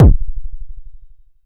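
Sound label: vermona drm1 mk3 bass drum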